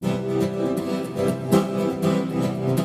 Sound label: Tremolo reverb